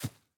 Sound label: grass, walking; factorio 2.0.55